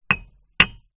impact glass bottle hit on concrete block non break